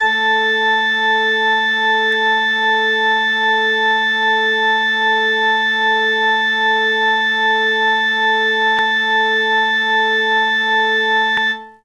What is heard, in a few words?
church organ; instruments; lmms